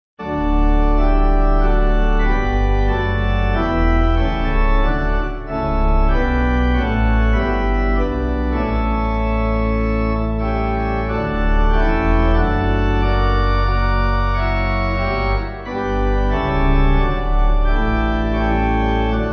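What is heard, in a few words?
My God I Love Pipe, Pipe Organ; St Francis X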